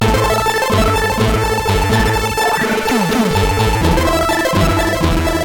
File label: X-Music Remix, CSG8580